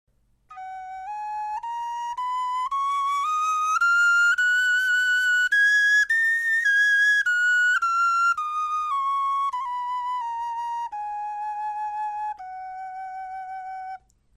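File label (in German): tonleiter, Knochenflöten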